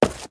Stone Run; moul-assets

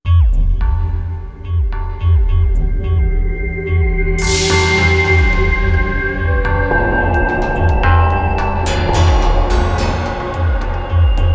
Bridge Music

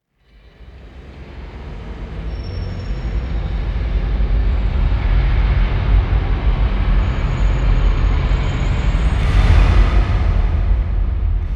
Pillar Wet

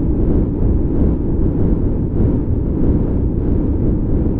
Dni Fan Loop

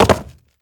Stone Jump

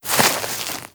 Grass Turn

